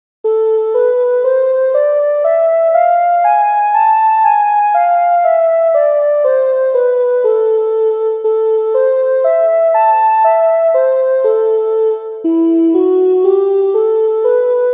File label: Harmonic Minor exercise